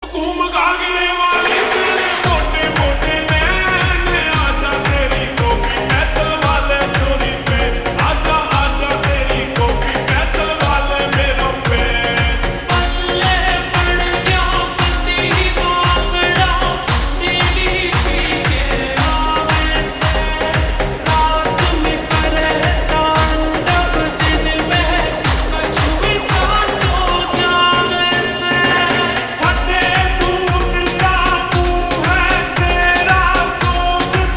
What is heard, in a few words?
Ringtone Slowed Reverb